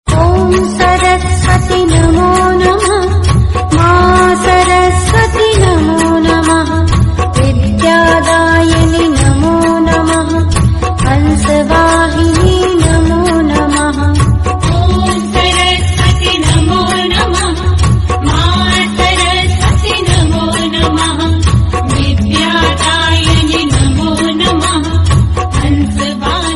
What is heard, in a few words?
hindi devotional song; saraswati puja